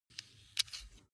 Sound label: sunglasses metal take off